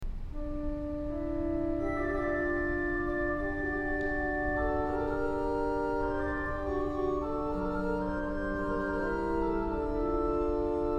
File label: Organ